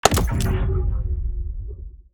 flashbang cast

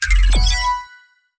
ui battle over

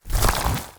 coral

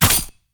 gunlance

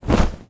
cloth movement

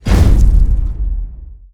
aircraft impact

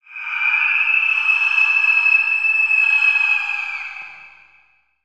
ghost scream

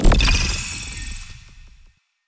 dark hole spell

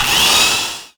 e atk saw

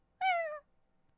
miaow

